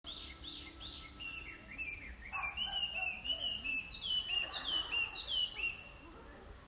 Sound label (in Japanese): ガビチョウ